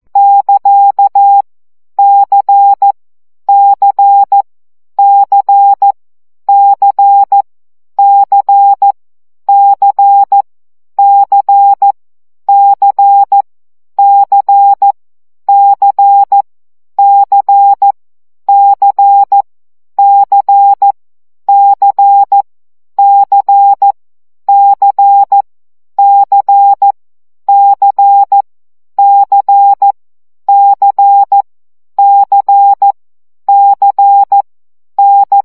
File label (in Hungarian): CW gyakorlas c 12 baud